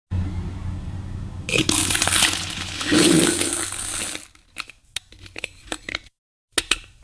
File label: toilet; Звук поноса и смыва